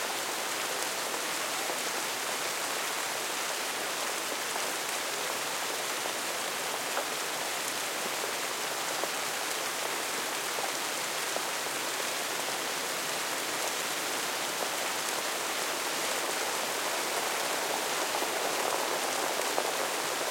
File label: better rain